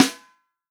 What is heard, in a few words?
FUNK SNARE CHROMATIC, REAL DRUMS